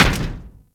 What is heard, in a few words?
Moa Step Default, Footsteps; Warframe